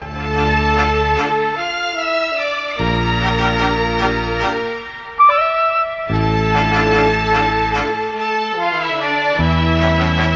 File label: horns, jazz loops